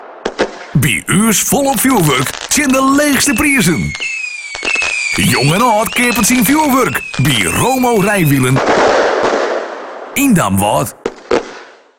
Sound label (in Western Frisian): Fjoerwurk middel; ringtone; Romo Rijwielen